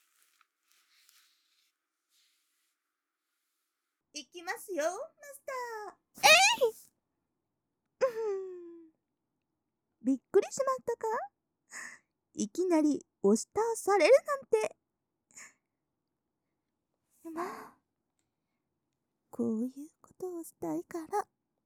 耳舐め, 舐め; リリスちゃん; 通常・バイノーラルコース

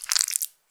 膣内射精後, 引き抜かれた瞬間; 挿入or引き抜く

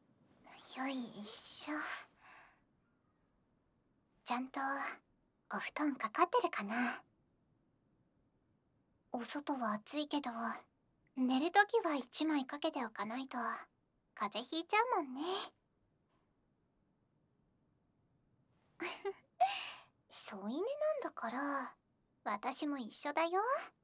添い寝; これはこれでいいよね…？

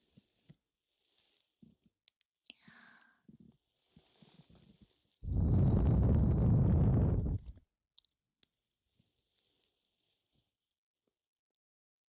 耳舐め（右）; 二人のメイドに耳をゼロにされる異世界; レムの耳舐め（右）

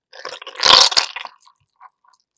射精音

